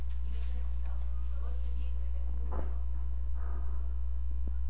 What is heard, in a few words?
cry